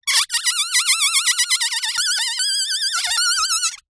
foley balloon air release slow squeak

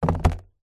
foley card box product pack set down

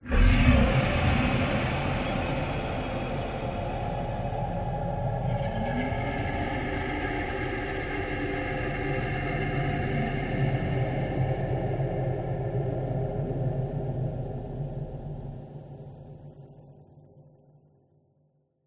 Ghostly Air; PMSFX

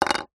plastic object hollow small down on surface; zapsplat

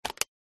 camera dslr lens on twist click canon eos 6d; technology; zapsplat

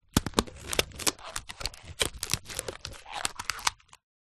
balloon skin stretch handle; foley

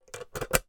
household safe small desktop metal key in lock

